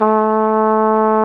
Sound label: Flugelhorn; Roland L-CDX-03 Disk 2